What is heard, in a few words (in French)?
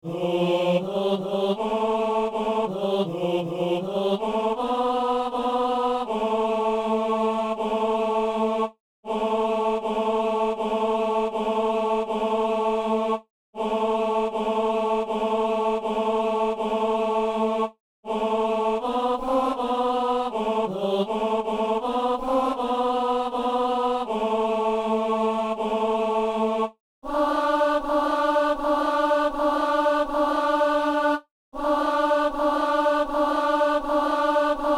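ténor; al messe au dieu de la paix agnus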